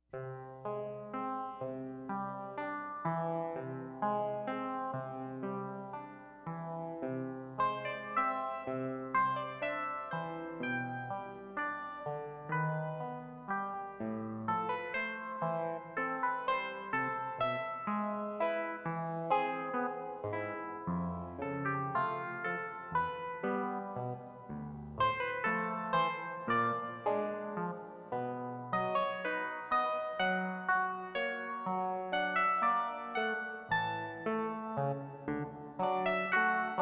Anthem for Keyboard Solo; TRON

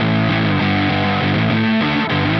Electric Guitar, Loops; Guitars

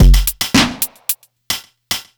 Urban Beat, Drums

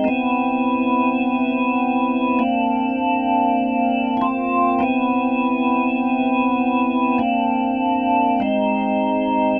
Organ

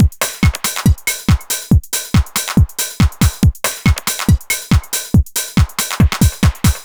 Dance Drumloop; Drums; Dance Beats

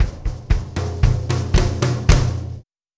Acoustic Fill, Drums

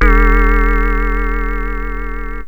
vox syn, yamaha rm1x synth bass and lead

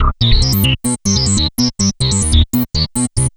Synth Bass; Basses

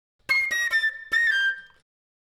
Flute

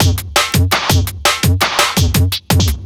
Old School Break, Drums

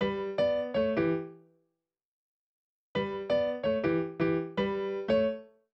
Acoustic Piano

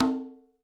Acoustic Percussion One Shot

